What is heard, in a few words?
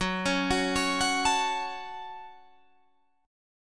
F sharp Minor, Guitar, Drop D; chordshape; down